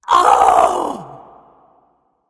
ouch; smite; voicepack; kali

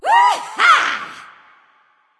yay; smite

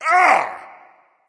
ouch, voice; Smite; ymir skin9

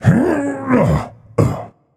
grunt jump; chiron

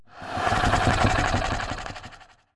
ruckle; smite; god cthulhu

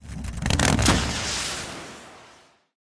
victory cyclop run; smite; thanatos

